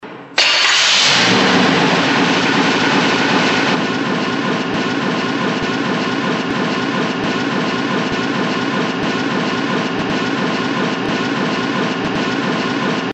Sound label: staring long